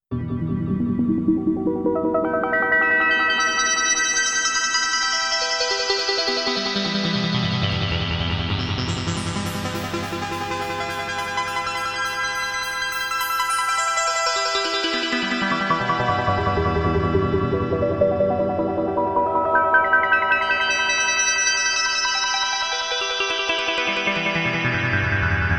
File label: Hypnotic Arpeggio